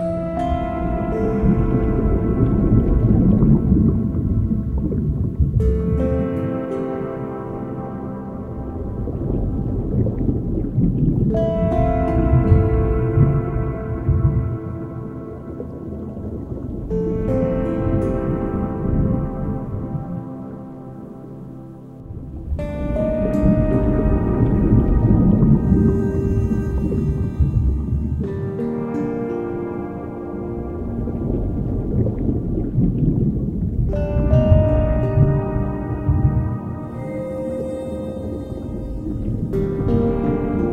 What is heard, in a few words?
Underwater; Fishing Strike; Android iOS gamerip 2018